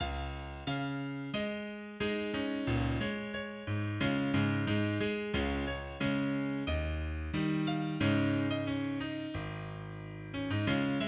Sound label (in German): Klavier